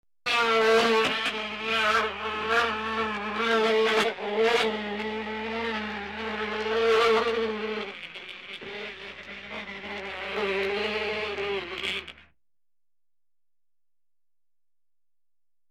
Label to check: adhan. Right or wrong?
Wrong